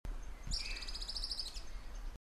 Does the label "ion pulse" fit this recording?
No